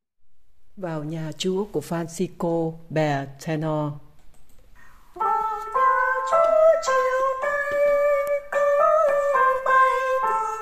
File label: Tenor; ca doan teresa